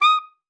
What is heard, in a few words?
D#6, ALTOSAX; Xsample VOL-2